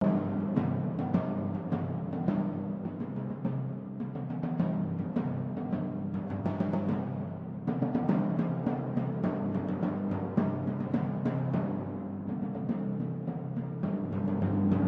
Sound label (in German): Pauken